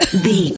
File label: voice female cough short